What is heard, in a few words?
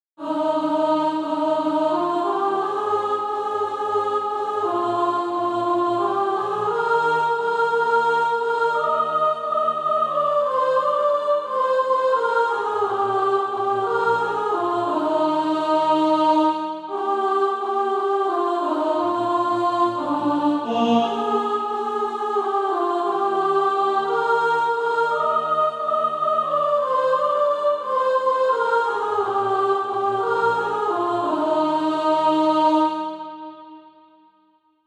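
soprano, SATB Practise Music; Draw Me to Thee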